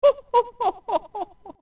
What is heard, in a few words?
Laugh